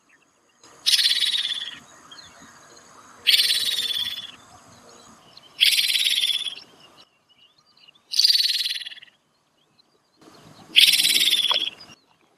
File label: Striated Swallow calls; Tambun Interior Ipoh Perak Malaysia